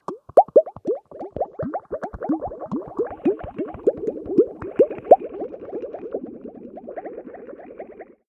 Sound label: bubbles magic popping